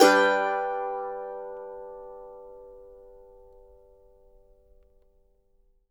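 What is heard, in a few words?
MANDO MAJ G major up, Left; Sampleheads; Whole Lotta Country 1 & 2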